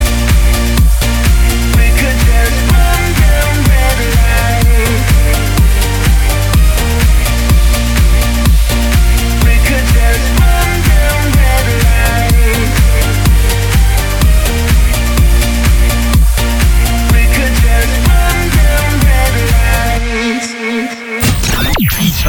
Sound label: jingle; hitparty